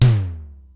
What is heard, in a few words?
Simmons SDS-9, Drum Machines